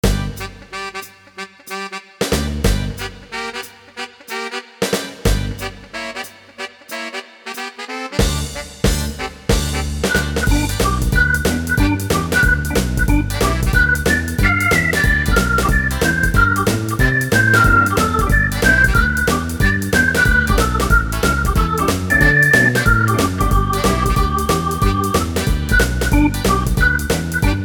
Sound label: Rock n Roll